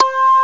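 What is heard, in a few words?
Guitar Harmonics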